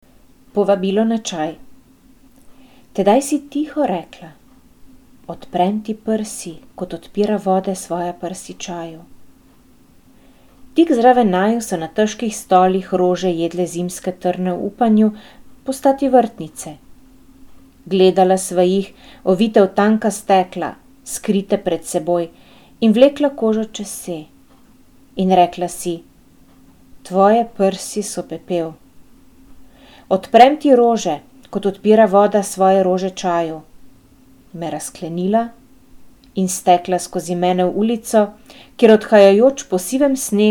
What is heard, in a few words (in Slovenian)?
pesem; slovenscina